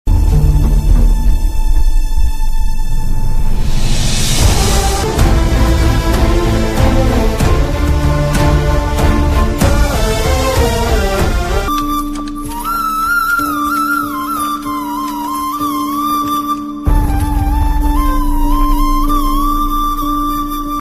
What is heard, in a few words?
heart touching bgm telugu; sita ramam